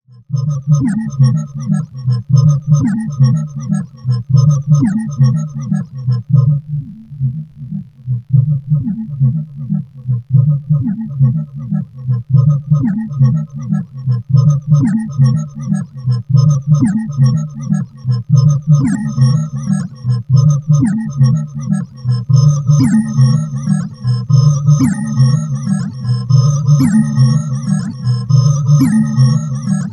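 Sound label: Korg M3R Gamelan Echtzeit Edit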